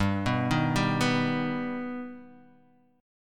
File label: G Augmented G Guitar Half Step Down